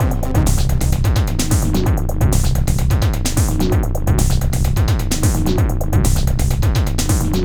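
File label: Core, Hardcore Breakbeat, 129 C minor; Big Fish Audio; Hardcore Breakbeat Action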